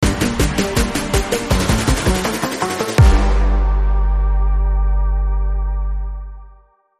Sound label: Brass Kicker